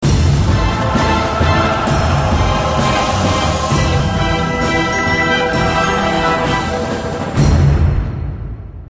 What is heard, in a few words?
monster defeated; Strong Bad's Cool Game for Attractive People; catacombs